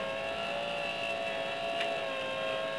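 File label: Motor loop, droids robots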